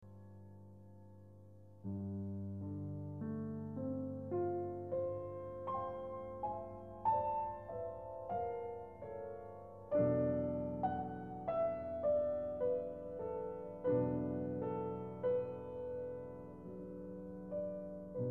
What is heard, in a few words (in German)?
melodie; klavier piano